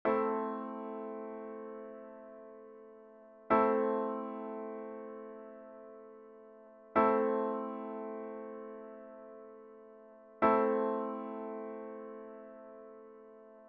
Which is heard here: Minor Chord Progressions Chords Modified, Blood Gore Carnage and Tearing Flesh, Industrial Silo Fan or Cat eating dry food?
Minor Chord Progressions Chords Modified